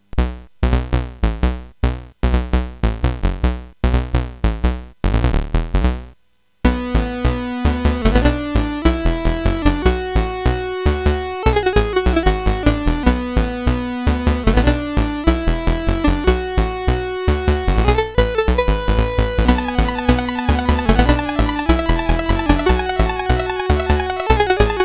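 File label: Pocket Rockets, MOS 8580 SID chip music, games; CSG8580R5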